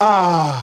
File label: "ah"; ooh ahh